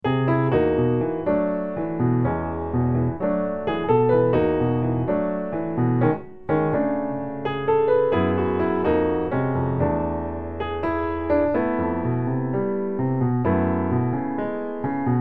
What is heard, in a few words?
Solo Piano Arrangement